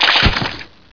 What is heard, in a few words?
drop, weapons; mp7